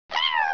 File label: headcrab idle; gearbox